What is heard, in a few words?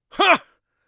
angry, Mage Voice Pack